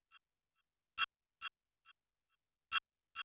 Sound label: delayed, techno 138 bpm; Best Service ProSamples vol.54; AKAI; CHICAGO 5 O